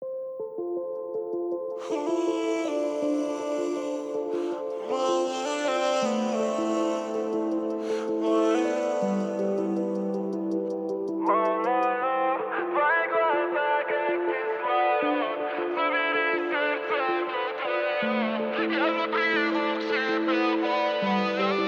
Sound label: 28.29.31hz Low bass